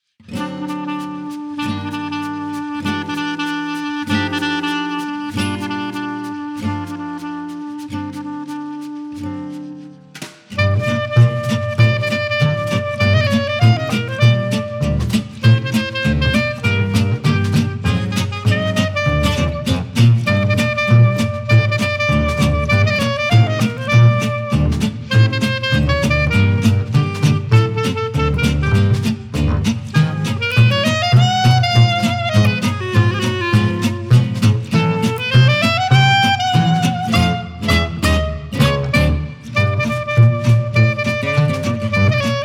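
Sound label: djaz orkestr, jazz orchestra; Ретро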